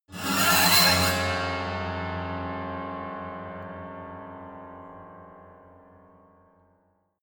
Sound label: piano bow string broken high